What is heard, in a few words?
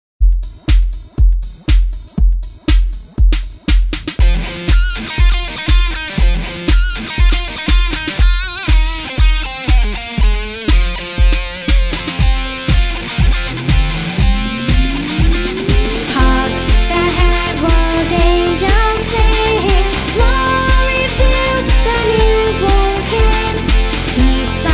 REPRISE Hark The Herald VOCALS